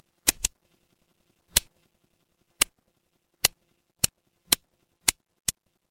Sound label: mechanical switch